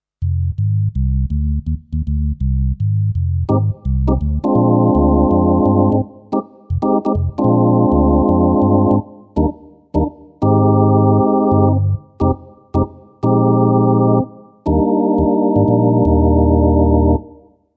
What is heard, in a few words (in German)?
Studiologic Numa X GT Orgel